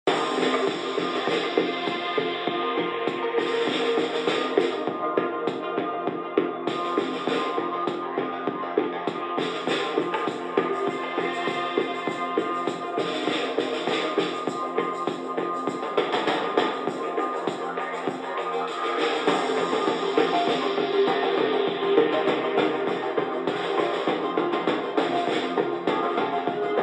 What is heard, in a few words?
Music Radio; Tanksshock